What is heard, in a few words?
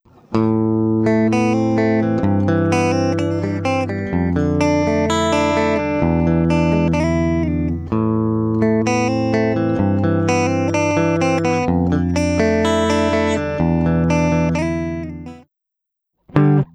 Pre El Gtr